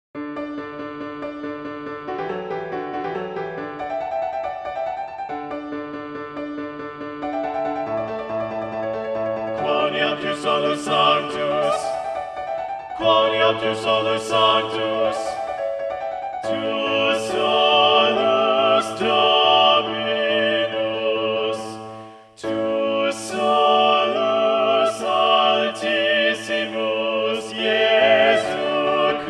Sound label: Balanced Voices; Quoniam tu solus sanctus; Gloria; Vivaldi